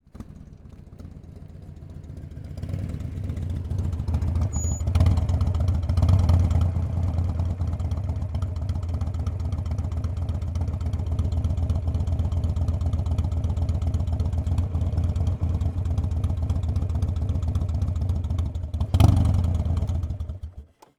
HARLEY Slow down, Arrive, Idle, Stop, Harley Davidson; Game Audio Factory